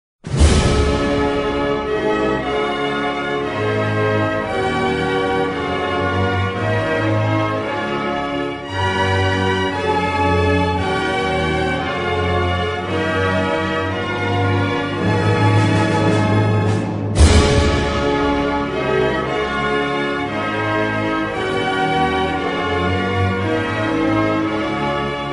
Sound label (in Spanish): musica